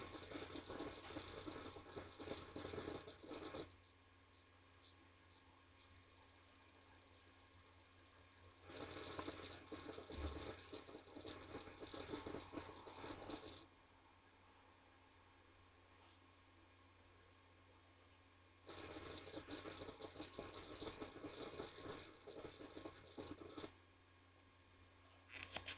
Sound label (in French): Bruit Western Digital